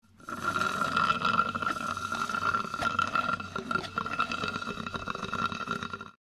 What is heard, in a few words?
Person drinking through straw slurp